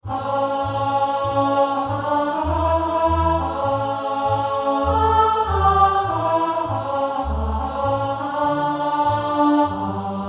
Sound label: Alto Bridge